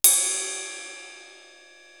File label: RIDE PING, PROC. CYMB; East West; Global Sounds